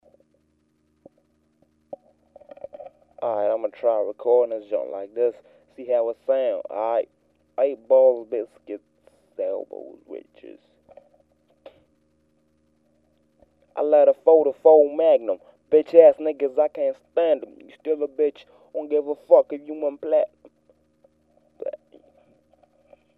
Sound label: Test Rap